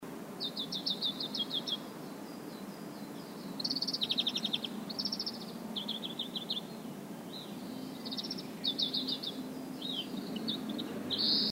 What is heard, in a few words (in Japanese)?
kawarahiwa